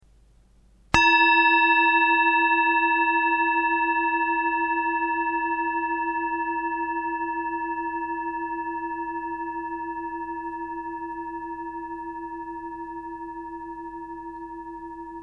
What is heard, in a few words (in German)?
Klangschale Ton